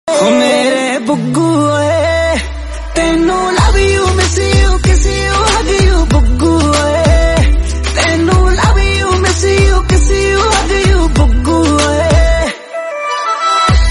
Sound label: Punjabi Song